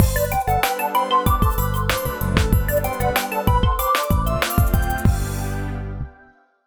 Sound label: Start; blokus; ps3